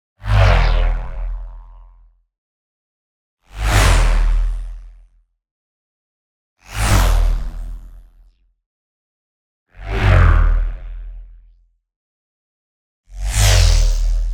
WHOOSH LARGE FAST Magnetic Fly By; boom library; Neon

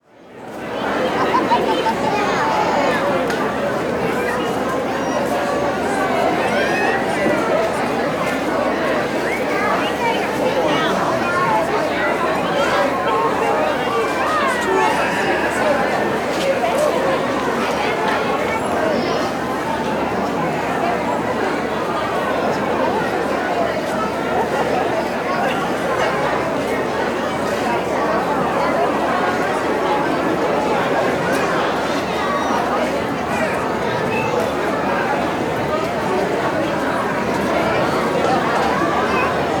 Crowd Indoor Busy Adult Child, Walla; Sound Ideas; Walla Series

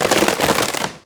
Coffin Emerge From Ground; SFX Kit; Foley - Misc

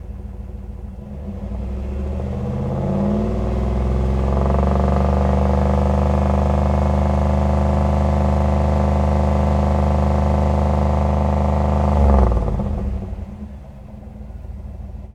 Z28 Fast Idle, Automobiles

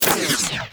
Menu Scifi; Menu FX

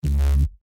reactor up; Code7

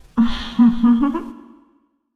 Chuckle; Deis

